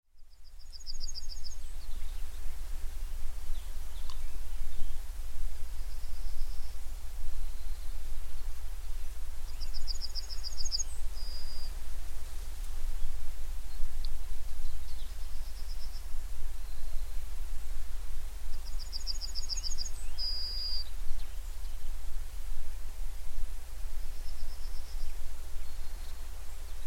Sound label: wind in trees bushes with birdsong; at ringstead